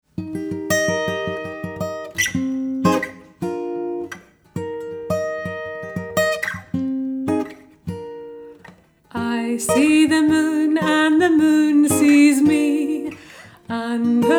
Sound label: I See The Moon female